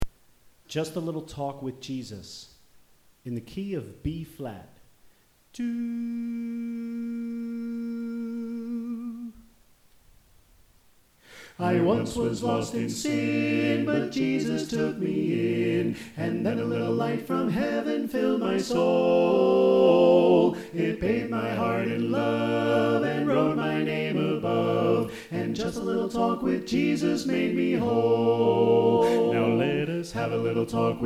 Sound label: Tenor Left